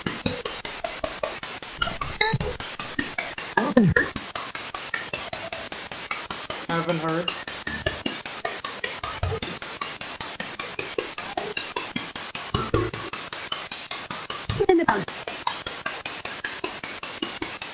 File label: Train Station